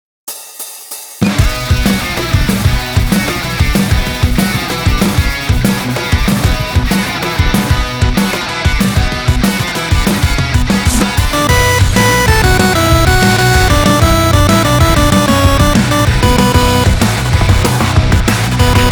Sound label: Rock